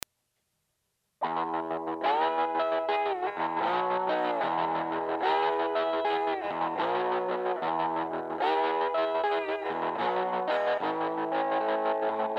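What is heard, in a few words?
hodad pick slide gain tremolo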